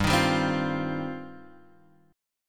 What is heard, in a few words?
G 7th Suspended 4th Sharp 5th G, Guitar Open G; down